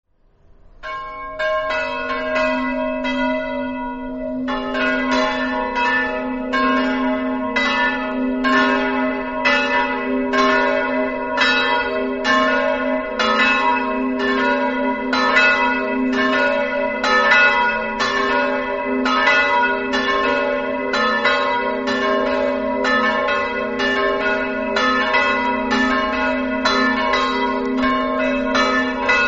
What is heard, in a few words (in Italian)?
case, campane